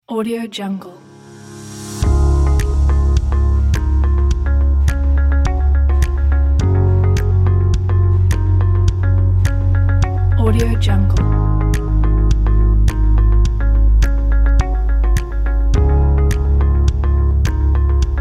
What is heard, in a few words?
calm motivate